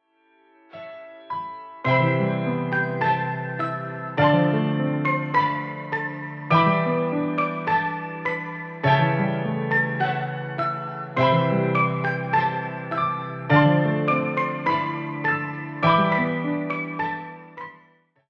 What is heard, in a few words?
Dreamy Eyes Am 103 BPM